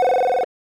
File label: dialing; Mistergenerator